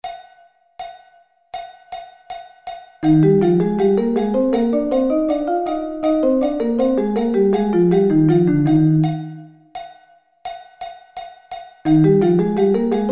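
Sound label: E flat Thirds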